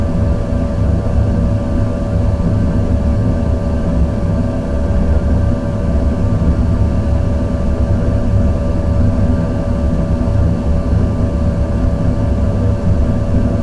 ambience; caged

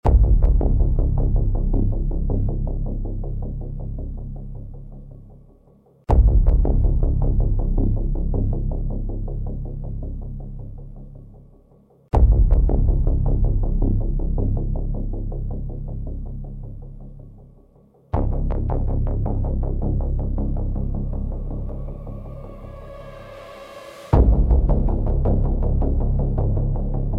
Military dread; sven

